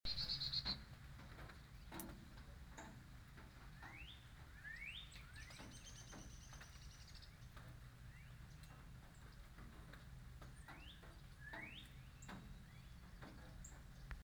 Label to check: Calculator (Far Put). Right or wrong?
Wrong